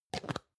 plastic can small lid close medium

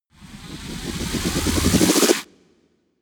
whoosh flutter

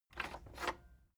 cassette eject click slow

